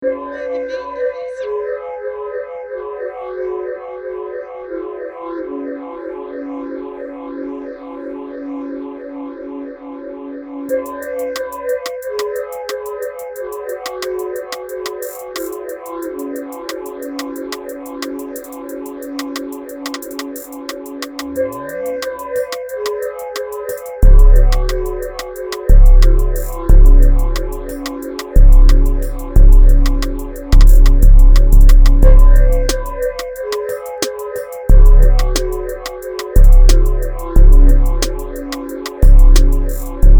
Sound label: BEAT 90BPM